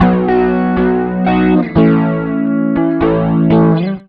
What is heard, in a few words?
rock, acoustic guitar loops